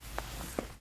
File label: Bag